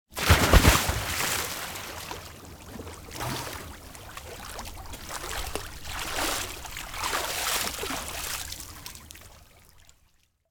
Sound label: ONE SWIMMER DIVE LARGE SPLASH SWIM AND GO OUT, Water Rush; Red Libraries